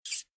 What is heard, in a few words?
spider Hit; customnpcs